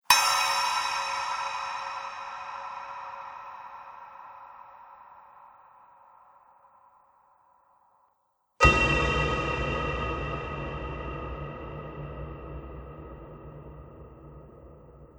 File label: 4X Metal Bangs